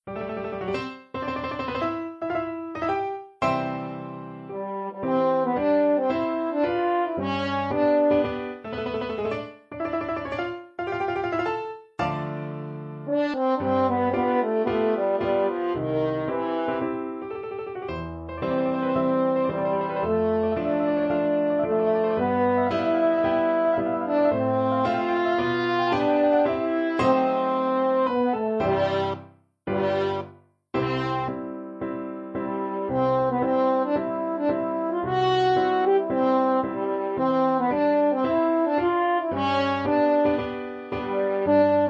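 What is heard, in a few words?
Brazil National Anthem, french horn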